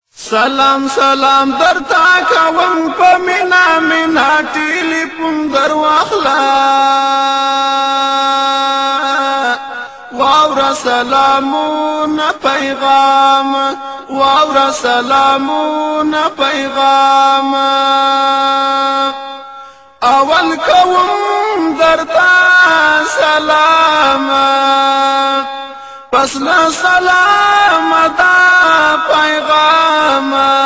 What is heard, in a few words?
Salam salam pashto ringtone